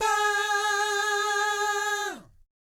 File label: VIB FX, Jazz FX